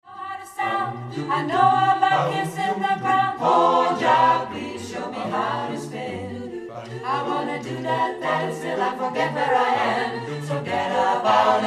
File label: ssatb